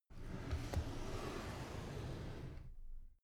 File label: drawer cabinet carton open soft